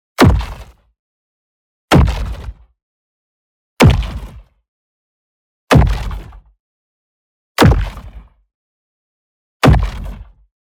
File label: EXPLOSION SMALL Flangy Bang; boom library; Matter